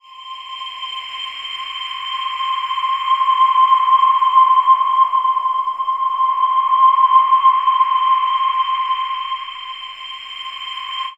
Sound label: Synth Atmos; Best Service ProSamples vol.36 - Chillout